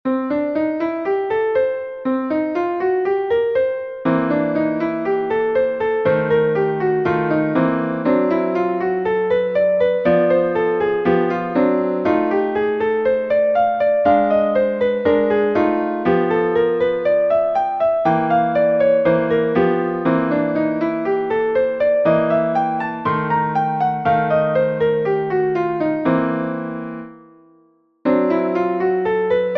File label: Blues Scales Up And Down; Blues Scales Up And Down: 1